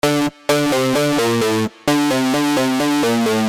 SYNTH Acid

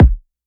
Kick E

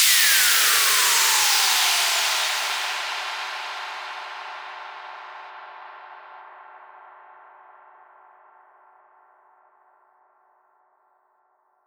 Sweep Down

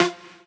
synth shot F

